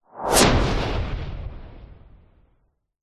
sound design whoosh short fast powerful crack long tail; zapsplat